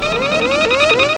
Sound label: increasing intensity alarm